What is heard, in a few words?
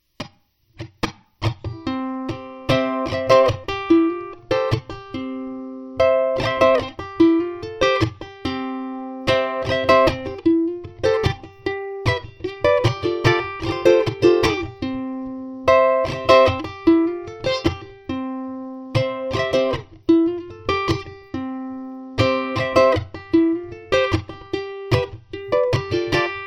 Tenor C major open strings